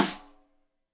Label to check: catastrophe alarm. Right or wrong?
Wrong